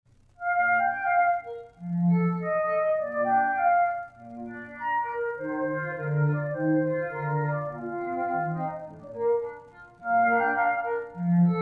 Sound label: Sonata in B-flat Major (2nd movement)